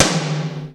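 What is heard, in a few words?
POWER KITS, tom; 90 sSampleCDs; Crypton - DrumBase5000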